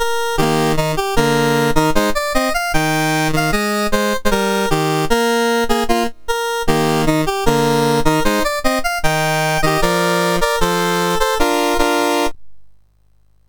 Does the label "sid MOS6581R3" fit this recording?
Yes